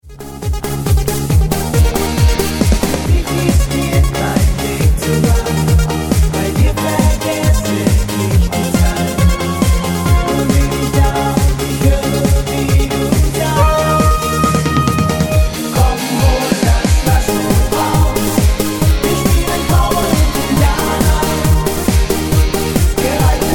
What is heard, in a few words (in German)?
mit Chor